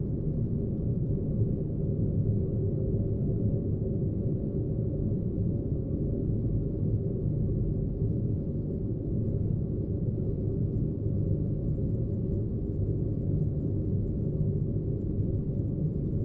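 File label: Ventilation vent ambience; Poppy Playtime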